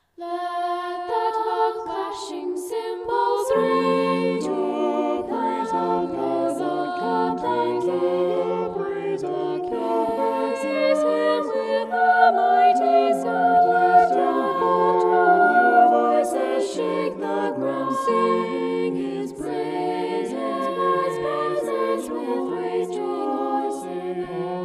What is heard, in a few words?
vocal poly; psalm 150